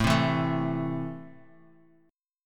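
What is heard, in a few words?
A Minor, chord strum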